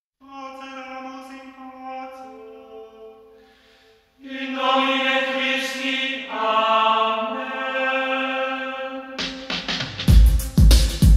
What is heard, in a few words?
8d bass boosted